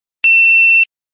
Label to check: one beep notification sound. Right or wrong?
Right